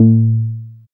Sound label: Bass SH-101